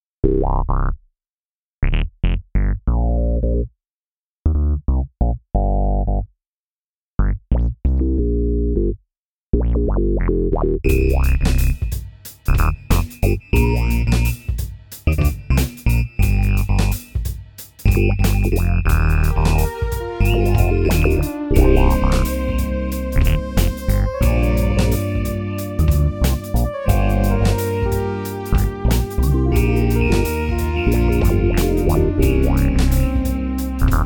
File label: Mello Funk Tronic; Big Tick; Rhino Electro